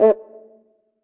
CUICA LOW, HR-16 Drum Mashines; EdgeSounds

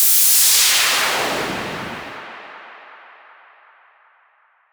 Whoosh, sci-fi

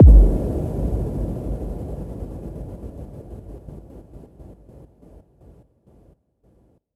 FX Impact Panchop

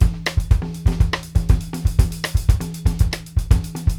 SAMBA, BRZIL 120; Sampleheads; New York City Drumworks VOL-1

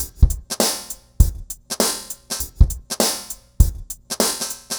Remixed Drums 100BPM; The Roots Drumloops

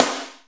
Snare, Akai MPC-500; Toolkits; Snare TKit

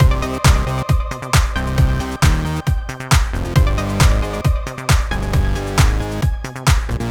135 Full Mix, Trance; Zero-G Trance Inducer; 90 s Sample CDs

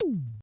High Tom, Yamaha PTX8

